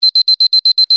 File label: alarm; CosmicRage; general combat